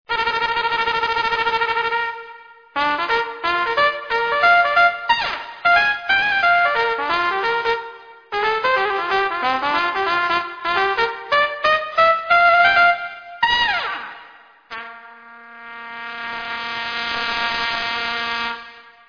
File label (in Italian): tromba; Squillante, deciso, chiaro e aggressivo.; kandinsky; Splendente, simbolo di vivacità e gioia di vivere.